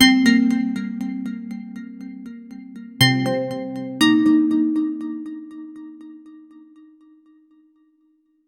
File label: short ui alert, acoustic guitar